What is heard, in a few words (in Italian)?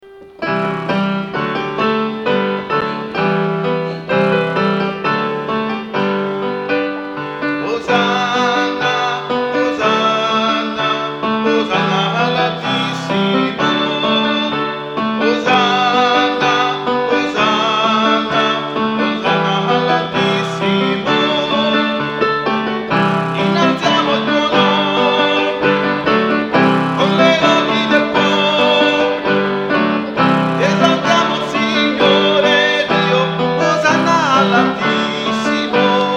Osanna all'Altissimo (ten); canti; registrazioni